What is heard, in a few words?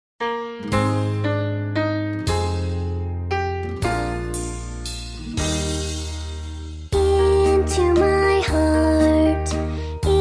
Childrens Choir